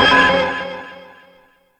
PAD, Yamaha DTXpress

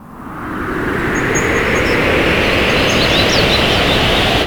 NOISE, Production Effects

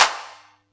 Yamaha EX-5

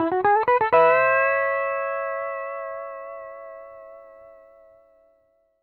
PD LOOPS 120, Whole Lotta Country

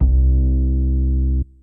SUB AC BASS; Kid Nepro; Elite

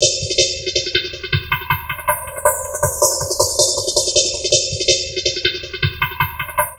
FLUID 160, Drum Loops Crazy Processed; USB Soundscan vol.07; AKAI

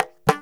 INDIAN DR, INDIAN SET; Spectrasonics; Heart of Asia

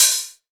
HH, Drum Mashines; EdgeSounds

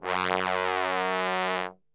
F#1 BASS TRB, Brass; Best Service; Brass Super Section

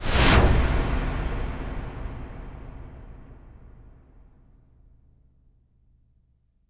IMP WIPE, Cinematic Impact

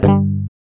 G, Acoustic; Sound & Vision; Gigapack I CD 2; GUI ACOUST.; GUI Acoustic 1

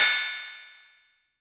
Cymbal Synth L; YAMAHA RM1X

